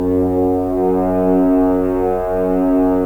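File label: Solo French Horn; Roland - LA Composer vol. 4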